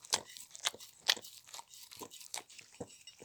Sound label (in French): lappements assiette; Bruitages